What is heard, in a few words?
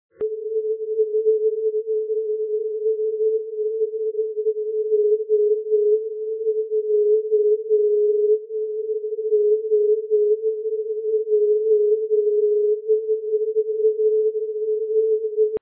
0.788MHz